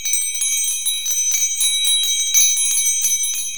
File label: CHIMES, JAPANESE